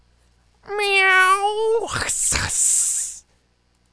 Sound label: CAT